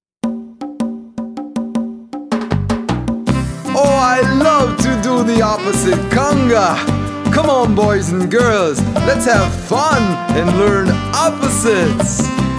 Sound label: opposite conga, Educational Children's Music; A nice springboard for learning more opposites